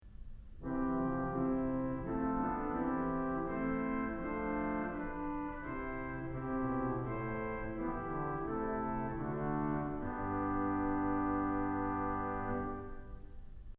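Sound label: Swell Trumpet; Kellogg Auditorium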